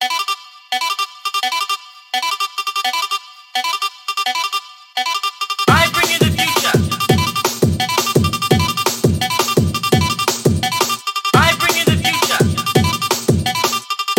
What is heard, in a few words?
breakage